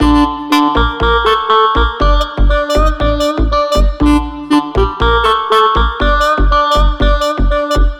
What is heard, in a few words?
slap ethnic loop